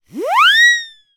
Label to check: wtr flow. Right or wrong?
Wrong